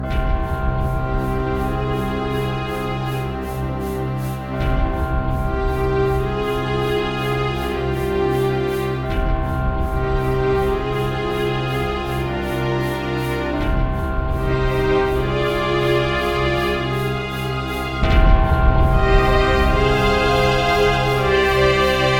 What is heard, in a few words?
Sad Loops; World War II Combat Iwo Jima; Xbox